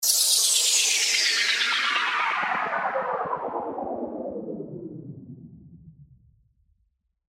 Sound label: Downlifter; SERUM FX